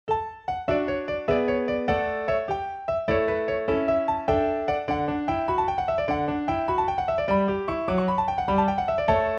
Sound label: Bosendorfer